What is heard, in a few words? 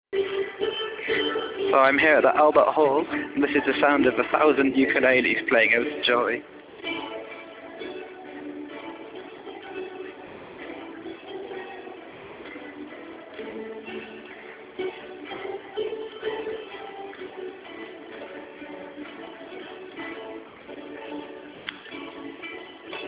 ode to joy played by 1000 ukeleles